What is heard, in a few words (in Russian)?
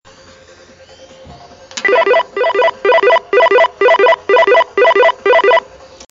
signal ob otkritii dveri domofona